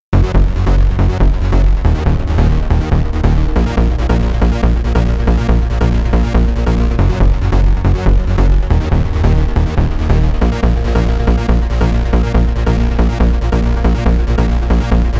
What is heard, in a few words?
bass